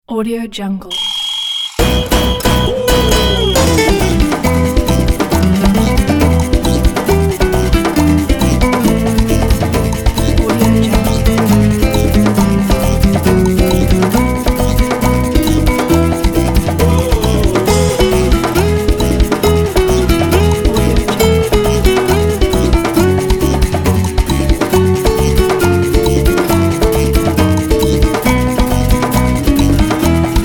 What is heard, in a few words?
latin sports